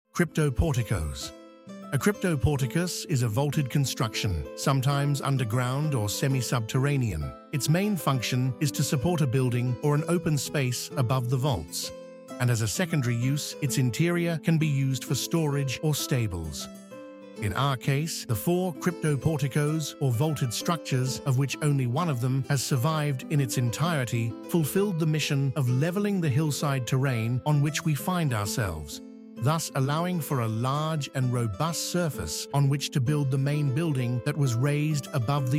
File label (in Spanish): música; archaeological museum audio guide